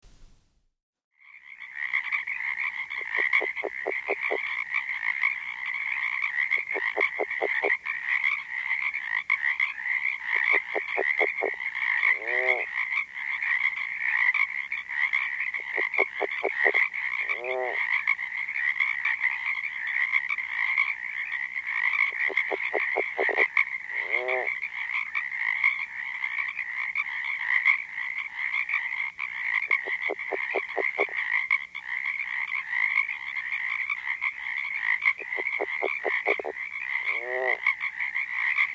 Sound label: Red legged frog